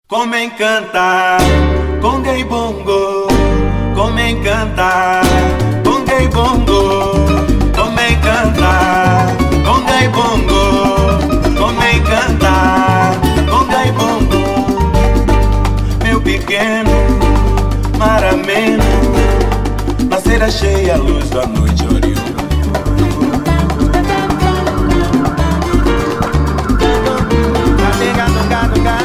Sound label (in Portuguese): Conga E Bongo